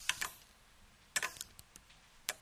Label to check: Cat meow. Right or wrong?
Wrong